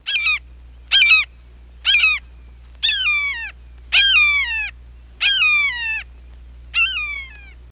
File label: Hawk Screech Generic x7